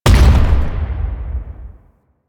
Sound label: Thumper Bot Thumper Impact Close C; Warframe; Grineer